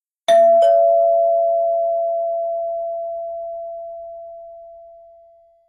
Message, phonetones, Nokia 5500 Sport

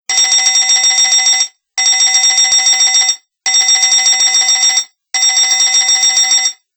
Telephone Ring; HTC 5800 Bell

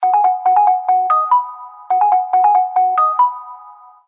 Hesitation, ringtones; Samsung; Galaxy A SHW-M100S

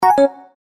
disconnected, Sony Ericsson; Z310i

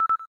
cam timer last

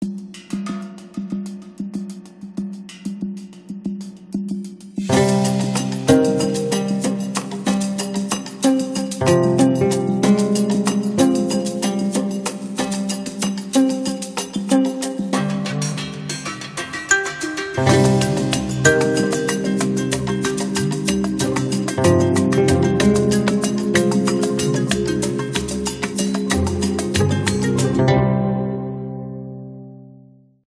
Oud Leader; HTC; Zoe video editor music

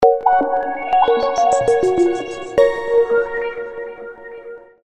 Power Off, phone tone

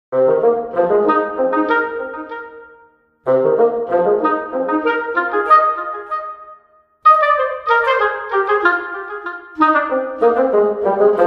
Clock Ensemble; Windows Phone apps

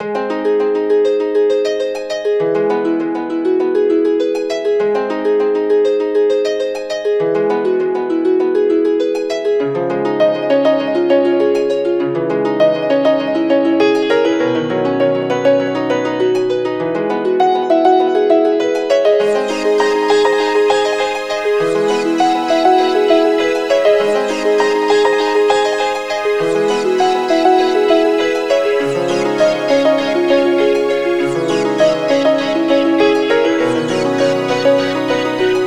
Krill Alarm; Sony Ericsson; PlusFourSix; Rika (W705); Lars Edvall

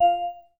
Class Harp, notifications

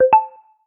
Emerge, notifications; Realme 8

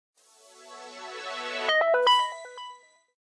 Power On

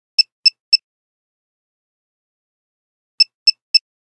Nokia Buzzer Knock knock; Windows Phone apps

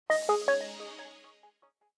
Power Down, phonetones EffectSnd; GD570AW dLite

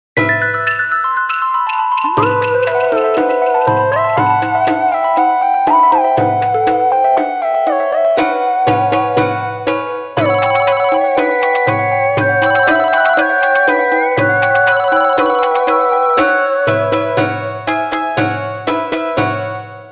Japanese ringtone; Nokia; Audio themes

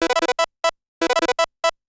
biptone, ringtones; Google; NewWave Labs

